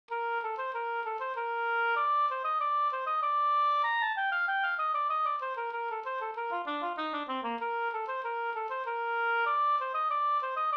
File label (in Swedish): slangpolska